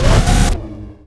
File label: turbo; vladimir